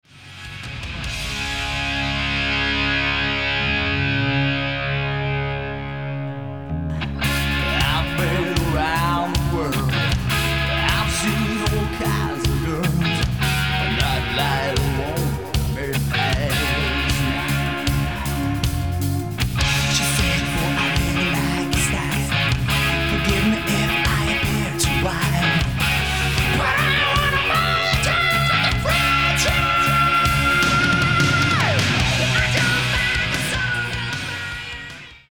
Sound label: Chords, rythm